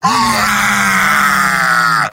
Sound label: yell; heavy; mvm